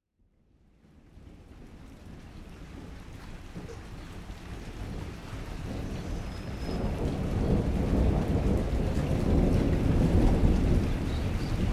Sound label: Rain and thunder Vedia Buenas Aires Province Argentina in January